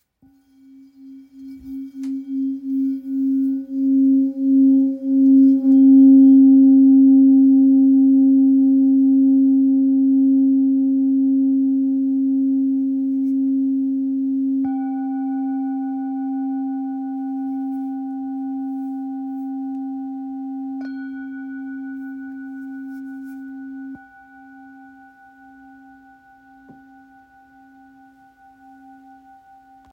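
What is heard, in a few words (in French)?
Full moon rose do, bol chantant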